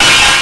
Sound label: Armour Sound; Sir Richard The Lion Hearted